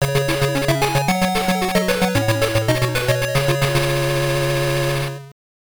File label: Round Clear; Shinobi II The Silent Fury; Game Gear